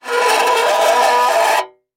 Metal Scrape

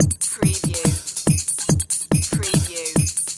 Techno-Trance Drum Loop 142 BPM

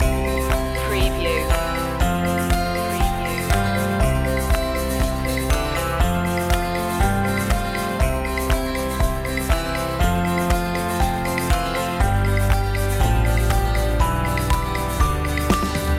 happy camper loop

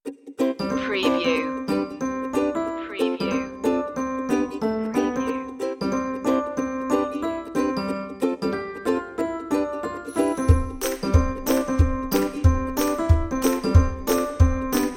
reggae happy piano version

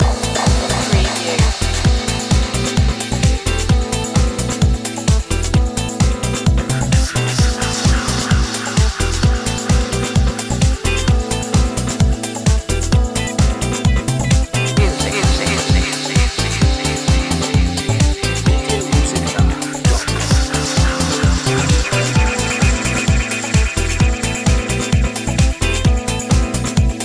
loop

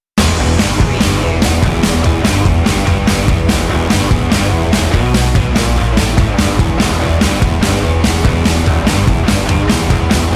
rock commercial

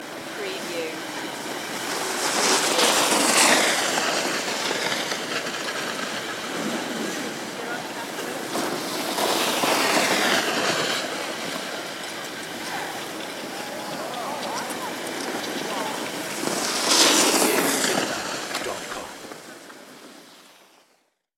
Three Ski By

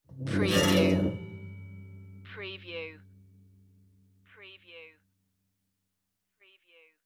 move metal furniture

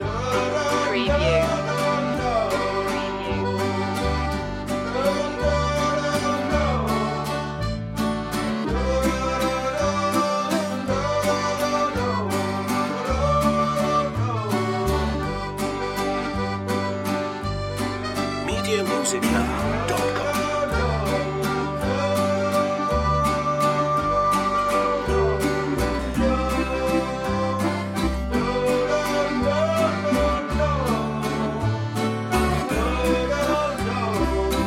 cajun bon ami loop

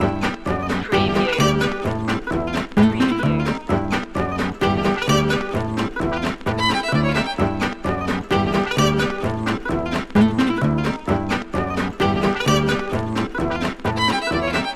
doo wop bop loop

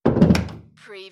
Domestic Door, Wood Door Close

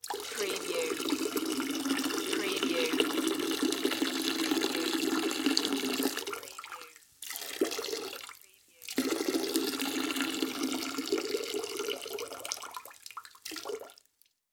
Pee Toilet Urinate

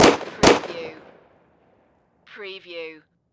Pistol 45 Springfield

